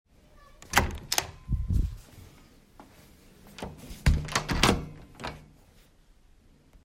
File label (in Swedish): dörr